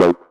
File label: 303 Acid F; Vengeance Samples